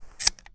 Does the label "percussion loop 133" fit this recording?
No